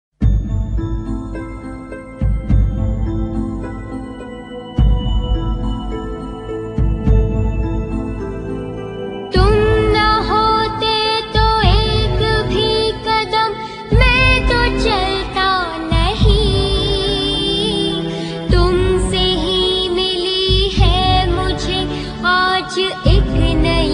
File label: Female; Rishtey (2002)